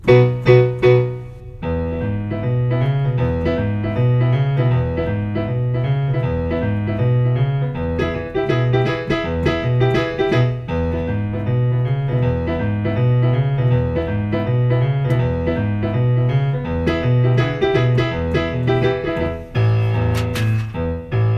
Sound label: accompaniment; pharaoh; male id